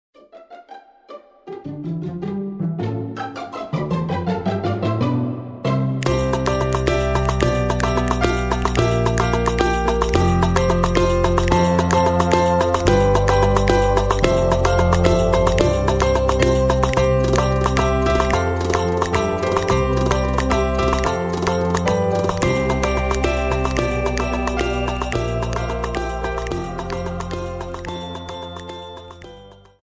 Wszyscy razem dzis spiewajmy wersja instrumentalna

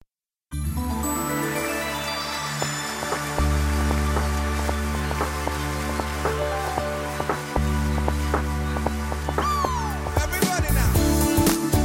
Hej witam Was wokalna; Teczowa Muzyka

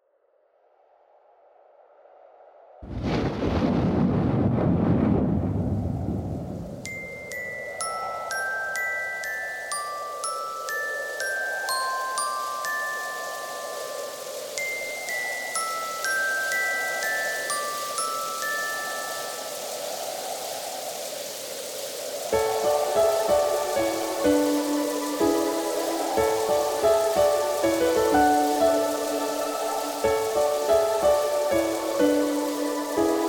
instr

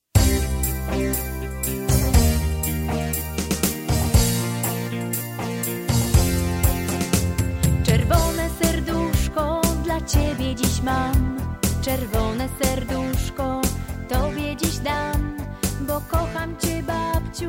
voc

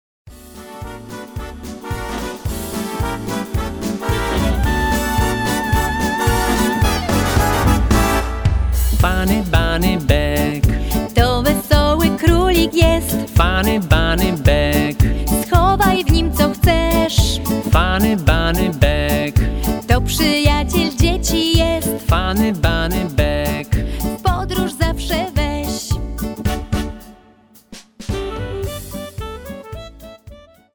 wersja wokalna

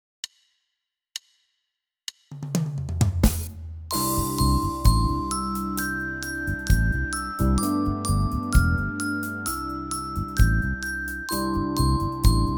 Byla sobie zabka mala instr; Teczowa Muzyka

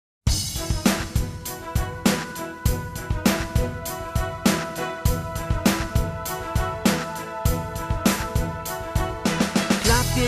Deszczowy rock roll wokalna

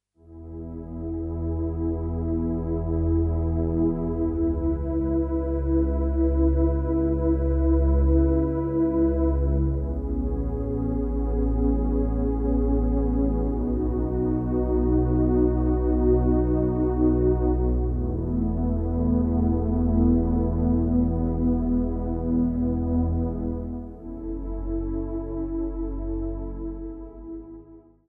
Opowiadanie relaksacyjne wersja instrumentalna

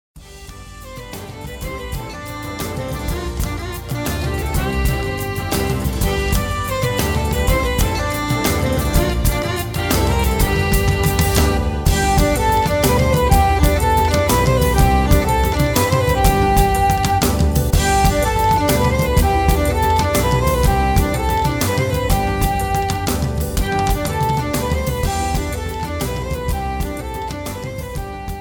Instrumentacja wiosenna

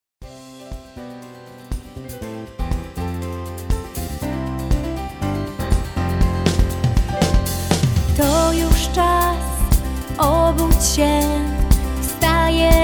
To juz czas wersja wokalna

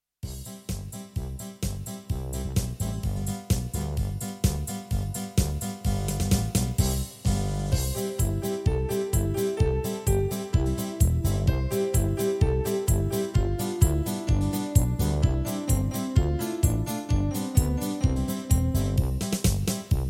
Poleczka majowa

